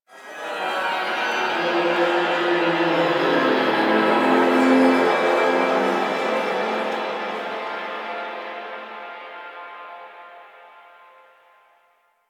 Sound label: Ampthitheatre Echoes; Ambience; Warframe; The New War; Zariman; Quests